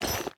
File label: equip diamond, armor; minecraft